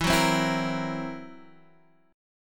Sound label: F sharp 7th Flat 5th E